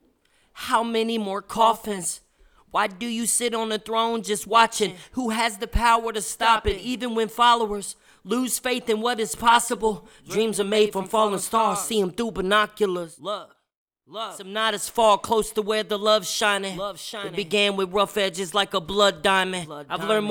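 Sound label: hip hop, 158, A flat minor, Acapella; Male; Rapping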